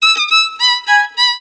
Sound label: Joy, happymusic; 特酷声音素材库; 背景音乐